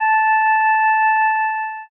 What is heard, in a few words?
tibet bell